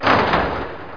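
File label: cart crash; JOHNNY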